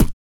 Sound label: VOX PRC, VOX KIT; ILIO; Vocal Planet VOL-3; Jazz & FX